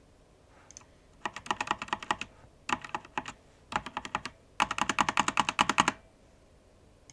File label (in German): Tastatur Leertaste